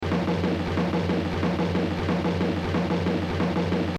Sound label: Exercise, jazz drumming